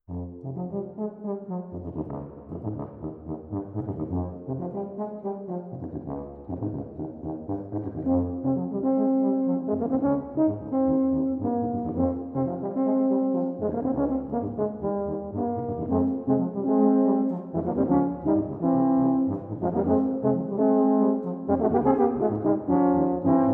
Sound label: Tuba Trio